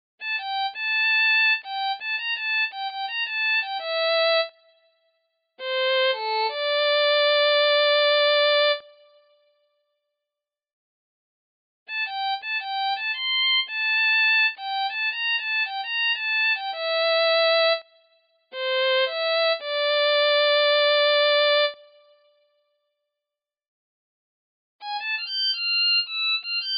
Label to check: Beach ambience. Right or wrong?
Wrong